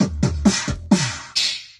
dubstepping, Beatbox, Drum loops

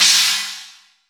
Real Open Hats

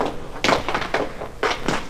dreadful feet taps, Drum loops

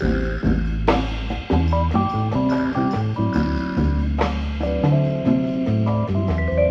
vibes; Phonky

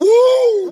woo

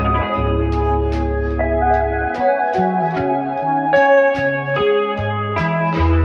guitar come in with heat; Loops; Phonky

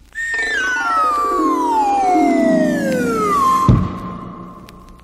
whistle down

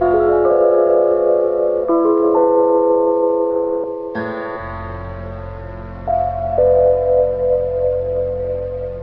vibes, Sad

